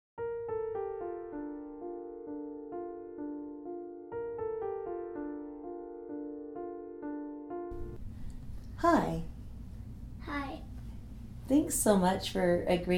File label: seven year old vox track